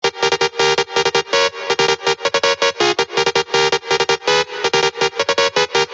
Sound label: techno